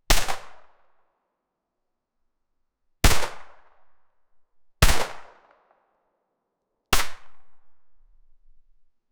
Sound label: ultra realistic glock 17, pistol